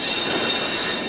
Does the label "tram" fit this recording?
Yes